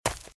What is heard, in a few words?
Footsteps Leaves Sprint Left; RadwinIsland